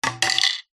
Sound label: sport tennis racket down on concrete